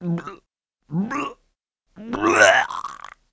Vomiting, ko, Adult, Male

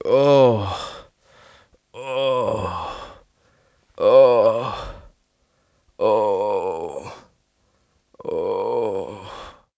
Moaning (long), Adult Male

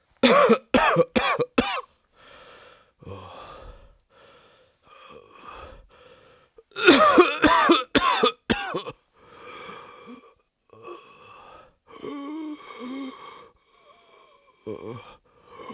Difficult breathing, Adult Male; sv

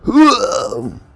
Vomiting, ko, Adult, Male

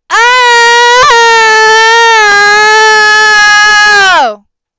Screaming, ko, Adult, Female

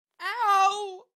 Pain, fr, Adult, Female

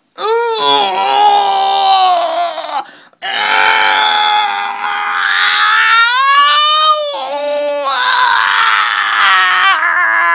Pushing - long double, Adult Female

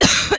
Hawk, Adult Male; nl; Female

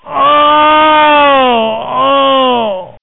Screaming, it, Adult, Male

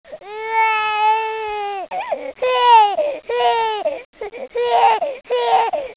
Strong cry, Infant

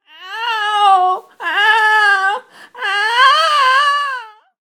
Distressed, Adult Female

